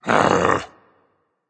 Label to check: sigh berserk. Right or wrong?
Right